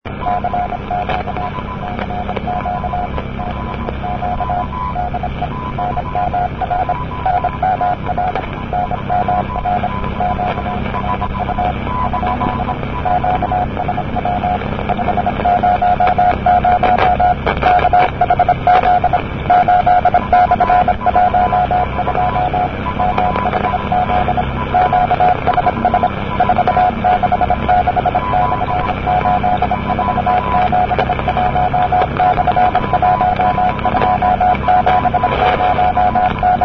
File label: cw cq